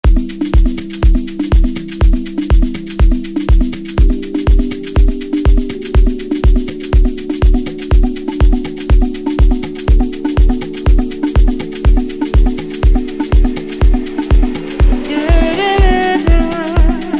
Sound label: drum